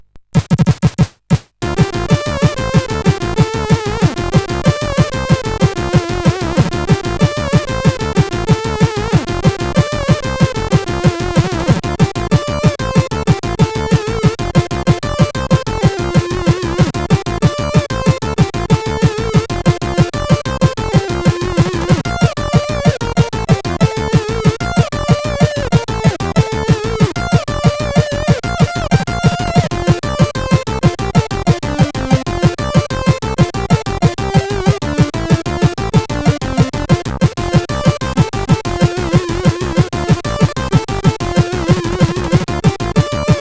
humppa CSG8580R5

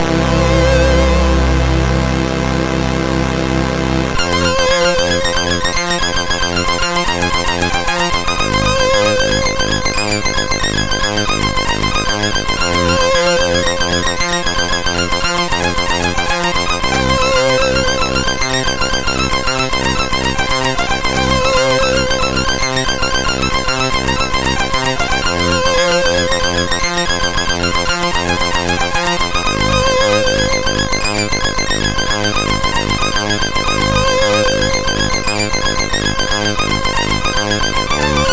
E-Load loader, MOS6581R4; hvsc

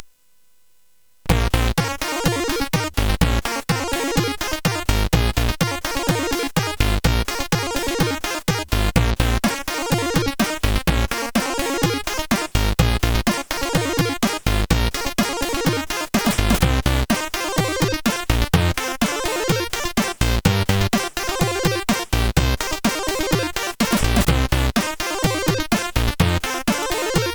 Arabian, CSG8580R5

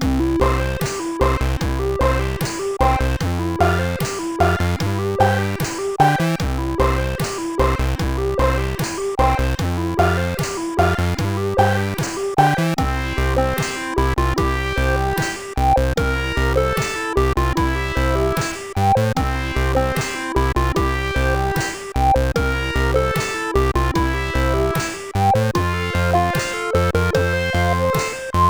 CSG8580

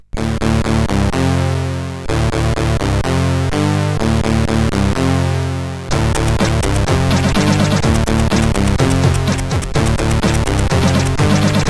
Being Busy, CSG8580R5

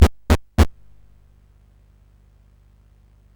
Dragonworld sid CSG8580R5

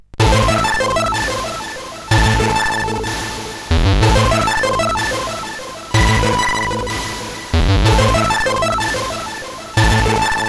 X-Music Remix, CSG8580

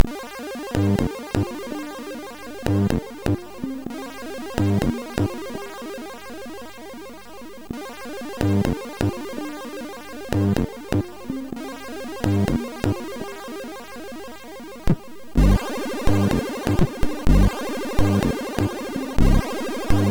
Dream Injection, MOS6581R4; Dream Injection note

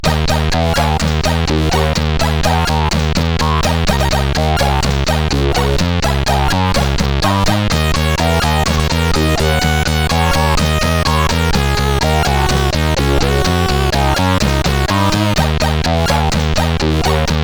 Test, CSG8580R5

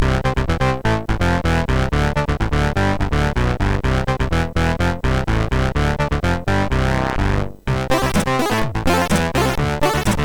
Yeah Yeah Wow Wow, sid MOS6581R4; HVSC

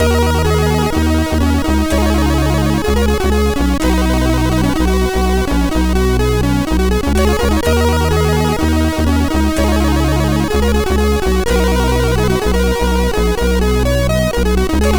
Oldline3, MOS6581R4

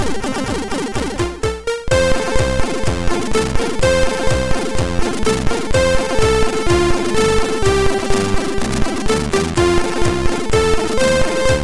Saints Go, MOS6581R2

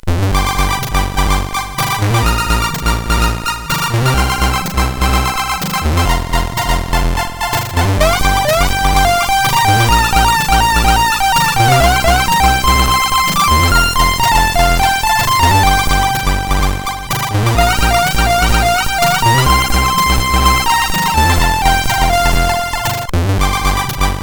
sid CSG8580R5; Hades Nebula; hvsc

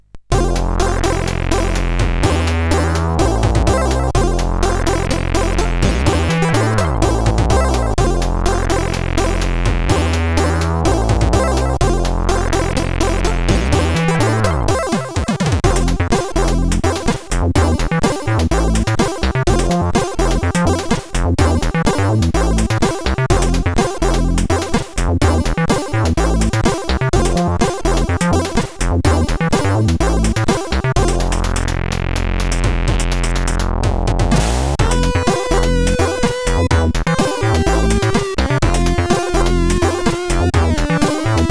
tune 1 sid CSG8580R5

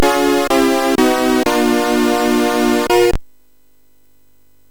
Sweep, MOS6581R3

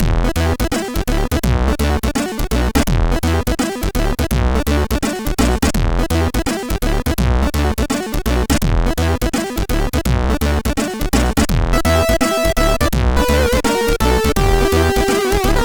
Short But Cool, sid MOS6581R3

